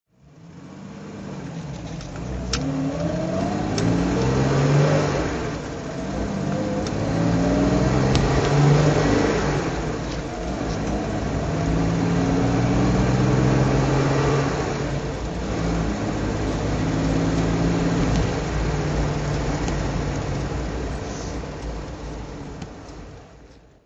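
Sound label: jag mk2 38 drive, Jaguar MK II 3.8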